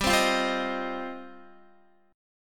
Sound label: G 7th Suspended 4th Sharp 5th, Guitar